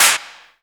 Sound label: sub clap; Q Up Arts; Dream Experience